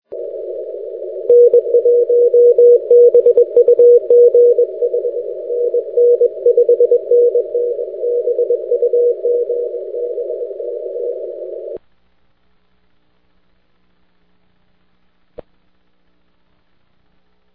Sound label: 6m